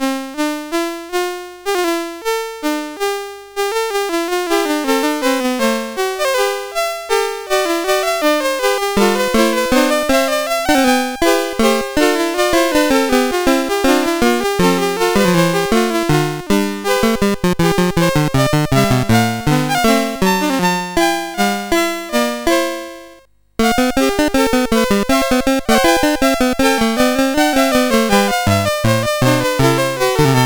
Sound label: Fugue No 1, CSG8580R5